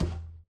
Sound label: walk, iron golem; mob; Minecraft